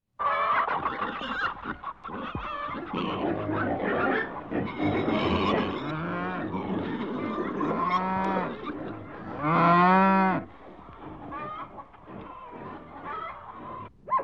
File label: Hen house sounds hogs in the background